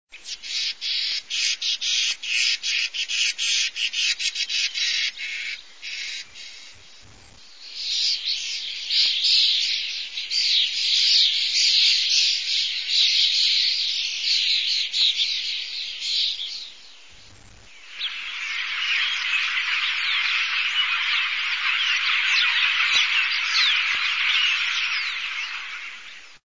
canto estornino pinto, aves campo

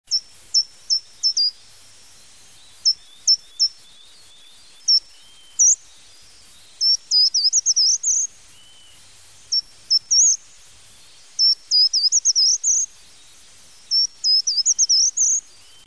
canto Agateador Comun

